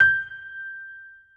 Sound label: Key piano; Luxembourg Zwee